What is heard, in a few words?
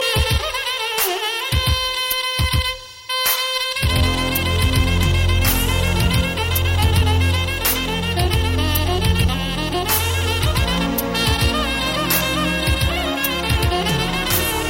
carnatic